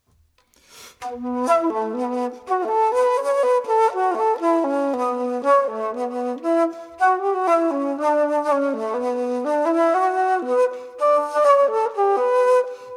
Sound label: Kyries Reel alto